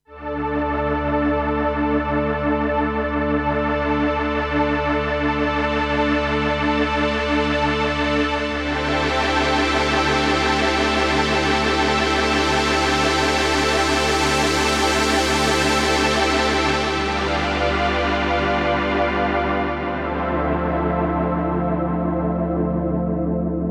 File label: Warm Pad; MakeProAudio Minimax